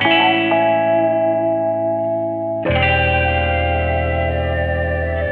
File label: guitar two strums plus keys